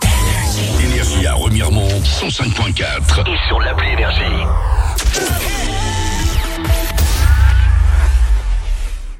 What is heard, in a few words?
JINGLE; REMIREMONT, 105.4 FM; NRJ